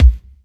low tone kick sound b key; kick drum samples

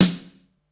shiny acoustic snare sample f key

